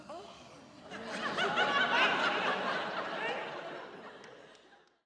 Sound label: crowd Reaction theater small Reveal; spellbound